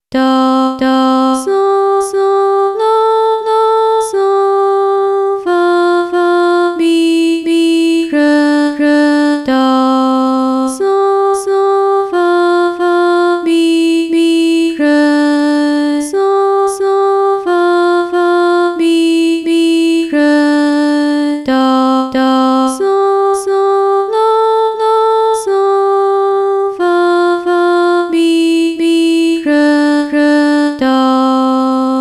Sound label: twinkle, solfege; Twinkle twinkle little star